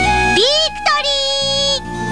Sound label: victory